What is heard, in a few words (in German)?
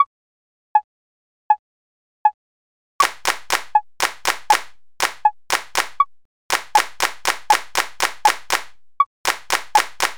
leseuebung 80, rhythmik; achtel-triolen-uebung